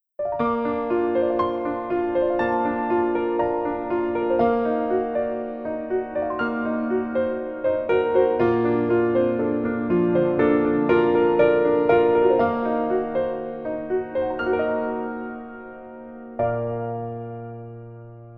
Piano ver 여자키

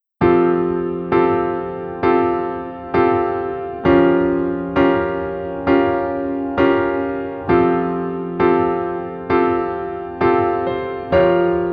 Piano ver, 멜로디MR, 여자키

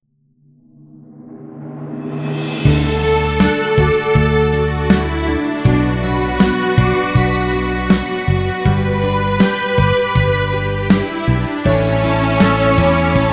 멜로디 Piano D Key

